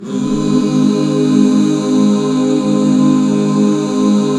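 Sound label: Db major, back chords; Sound & Vision - Gigapack II CD 1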